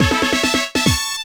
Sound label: Jingle; Imagine Master Chef; Imagine Happy Cooking